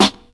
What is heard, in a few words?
clean acoustic snare sample g key, steel snare drum sample tuned to the G; snare drum samples